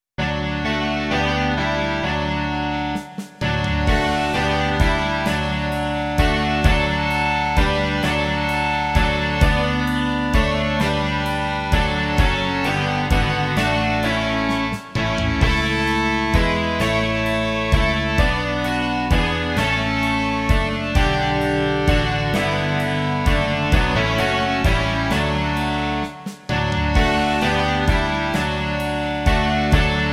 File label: rock